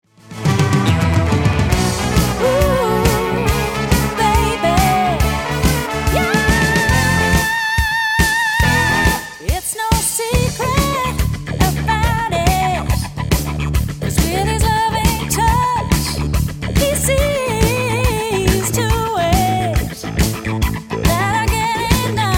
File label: Chunky Jam Horns